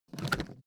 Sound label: suitcase lift up